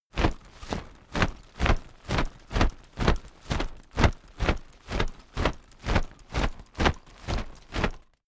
umbrella telescopic shake slow hard

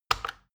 plastic button tiny click regular fast

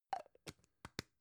gum plastic box close soft

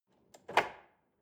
drawer large lock open

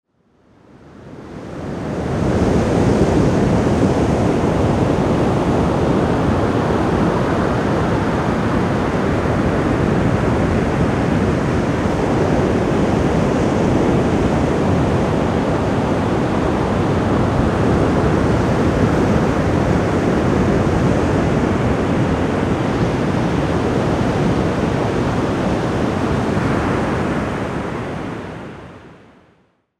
ocean beach in washington

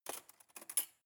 cutlery cake fork drawer grab soft

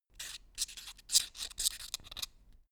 quill write

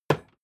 hammer wood hard

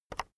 keyboard external enter release soft

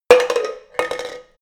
plastic fall

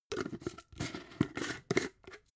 jar glass plastic close

